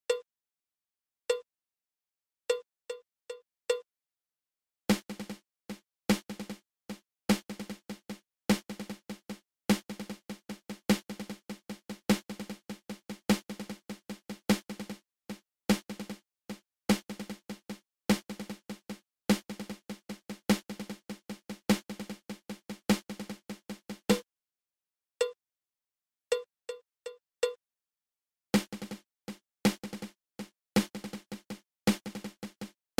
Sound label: Drag Paradiddle 1